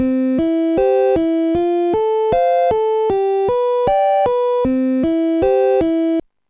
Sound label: arpeggio; Sonix Music Driver